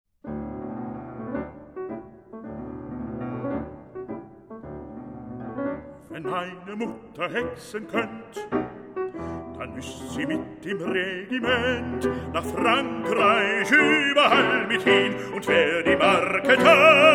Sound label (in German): bariton klavier h wolf; franz grundheber; matthias veit; label spektral